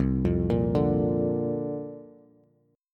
C 7th Suspended 2nd Suspended 4th, Bass; arpeggiated; C7sus2sus4

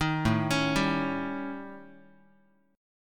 A 7th Flat 5th A, Guitar; A7b5 arpeggiated; 6,5,4,5,x,x; 4A,4E,5A,5D,5G#,6C#

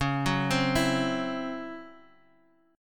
C sharp Minor Major 7th Double Flat 5th, chord; C#mM7bb5; arpeggiated; JGuitar

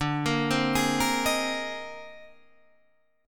C Minor 7th Sharp 5th D; Cm7#5/D arpeggiated; JGuitar

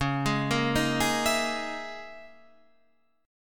C sharp Minor 6th Add 9th C sharp

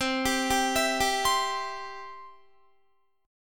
C Major, Guitar; arpeggiated; 19,22,19,21,19,20

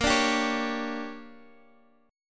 D Major 7th Suspended 4th Sharp 5th

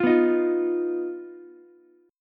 D sharp Suspended 2nd Sharp 5th D sharp Ukulele; strummed; JGuitar

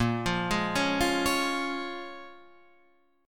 C sharp Major, A sharp, chord; C#/A#; arpeggiated; JGuitar

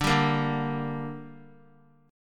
D Suspended 4th Sharp 5th D down, chord; strummed

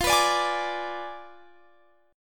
F Diminished 7th F down Guitar Standard

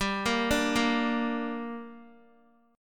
G Minor G down Guitar, chord; Gm arpeggiated

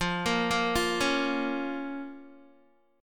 F Suspended 4th Sharp 5th F, Guitar; arpeggiated; 12,13,10,10,13,x; JGuitar

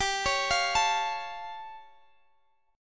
A Augmented 7th G

